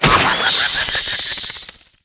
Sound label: pumpkin bats laughter; Angry Birds Seasons